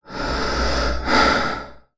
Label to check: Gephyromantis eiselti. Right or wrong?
Wrong